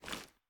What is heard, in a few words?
ads up, weapons; gmod; viper